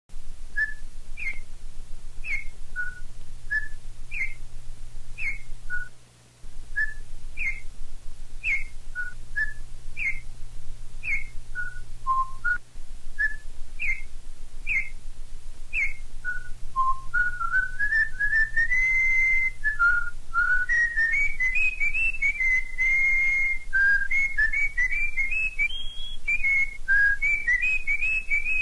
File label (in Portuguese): currupiao; fauna